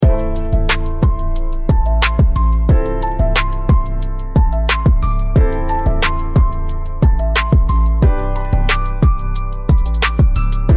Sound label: launchpad loop